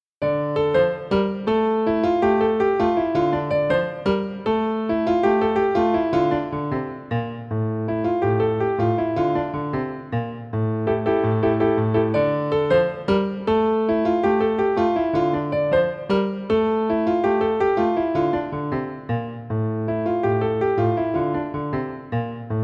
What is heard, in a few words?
Salsa